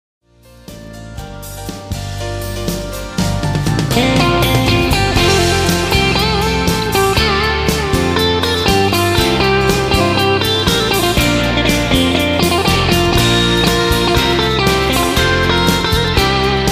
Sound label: Solo